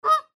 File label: goose squawk